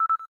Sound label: cam timer last; LG; Zero H650